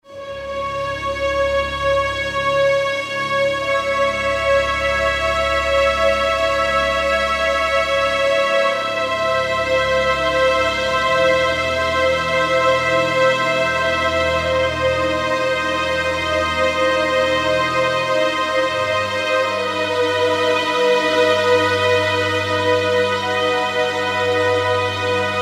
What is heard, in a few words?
Classical Suspense; film elements